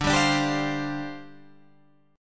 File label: G 7th Suspended 4th D